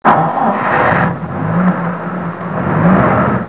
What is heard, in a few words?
jeep start